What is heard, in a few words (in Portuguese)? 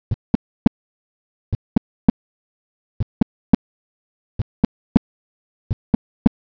S4 galope, sons cardiacos